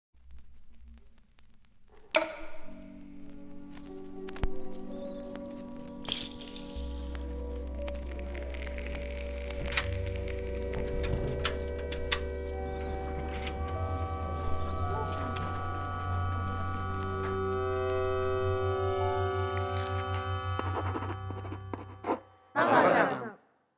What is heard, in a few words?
soundlogo; magasinn